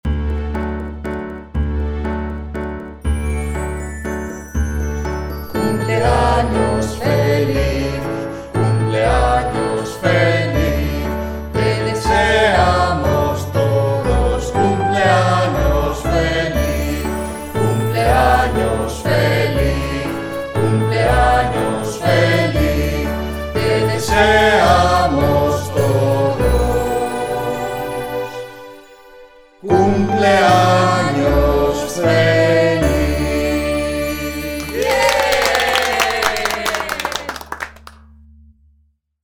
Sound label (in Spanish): Cumpleanos Feliz Spain; Singing Bell